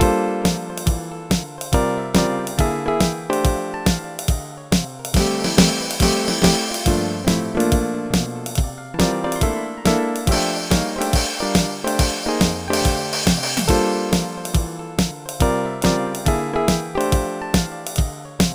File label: solo; Dr. T's MIDI Recording Studio